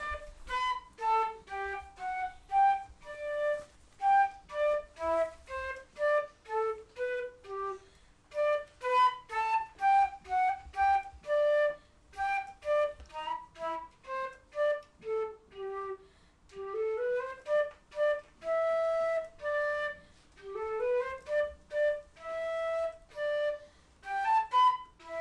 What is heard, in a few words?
flute